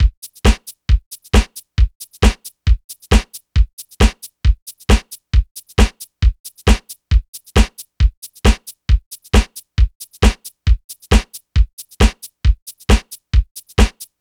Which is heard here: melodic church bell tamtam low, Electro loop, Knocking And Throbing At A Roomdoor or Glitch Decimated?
Electro loop